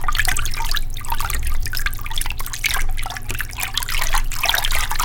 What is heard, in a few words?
Fluid Mouvement Medium